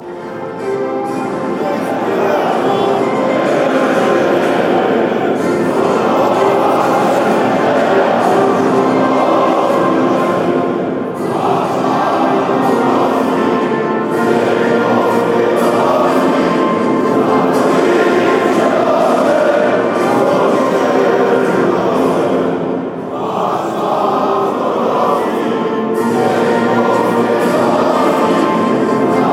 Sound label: poland national anthem fanchants